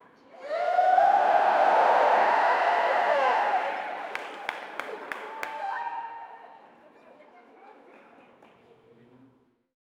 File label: a brief burst of enthusia, pool